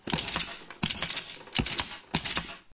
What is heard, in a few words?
Shitting large pause Music; Tom shitting large pause